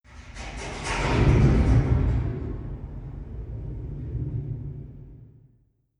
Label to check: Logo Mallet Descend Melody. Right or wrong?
Wrong